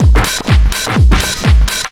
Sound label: drum loop 125, flying filter, vocal house; PS-28 Vocal House Samples; drumloops